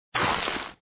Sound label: beehive explode; operation graduates